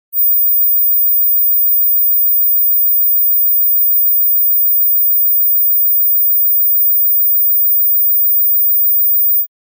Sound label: teen buzz ringtone